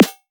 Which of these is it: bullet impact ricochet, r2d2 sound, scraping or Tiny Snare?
Tiny Snare